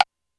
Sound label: Lo Foley, Alesis D4 drum machine